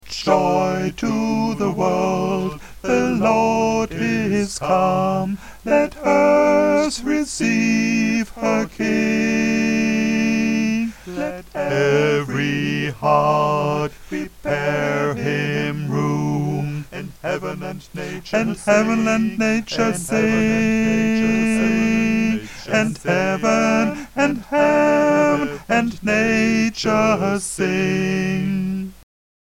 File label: Tenor, gospelchor; Joy to the Wolrd